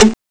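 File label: guitar stop; Amiga Soundtracker Sample Packs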